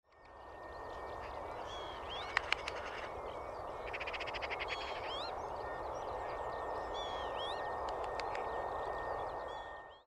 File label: woodpigeon